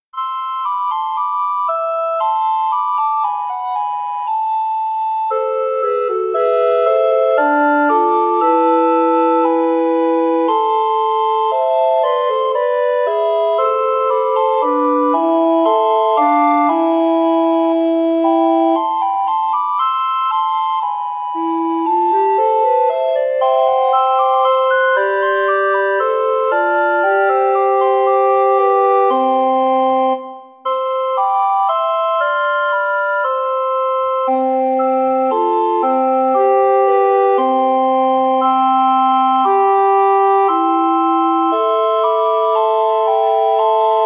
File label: recorder quartet